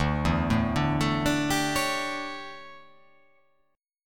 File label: D Major 7th Suspended 2nd Suspended 4th D down